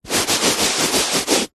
hand on carpet several fast rubs as if cleaning circular motion